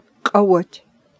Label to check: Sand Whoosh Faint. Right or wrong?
Wrong